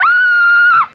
scream

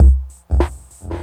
grammas organ, Drum loops, Electro

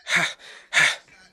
ha ha

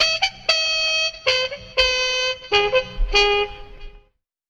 horns, Loops

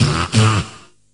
beatboxed, Stabs

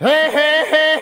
hey hey hey SUNG

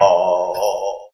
"ahoh"; Voice; ooh ahh

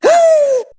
"hoo", voice; ooh ahh

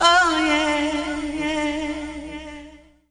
"oh yeah" SUNG

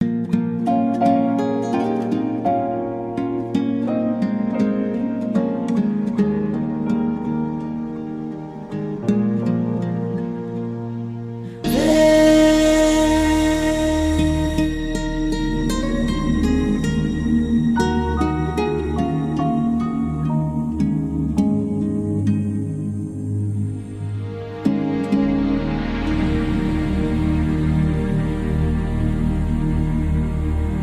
guitar, Loops, Sad

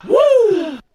"woo", voice

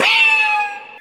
ricochet scratch; Crash Bang Boom

